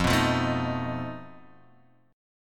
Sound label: F 7th Flat 5th F